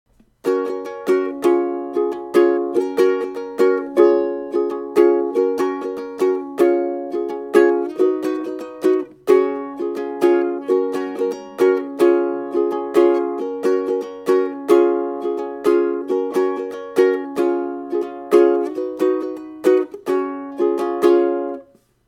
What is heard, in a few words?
chorus, ukulele